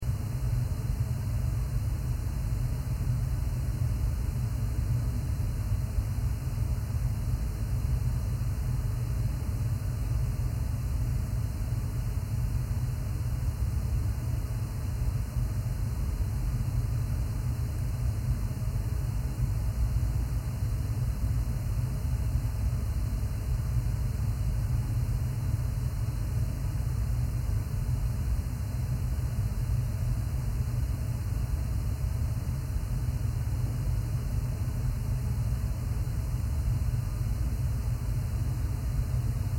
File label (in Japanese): 押入れの環境音